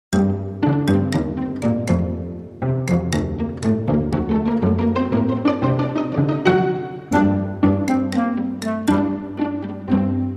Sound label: Pizzicato Curiosities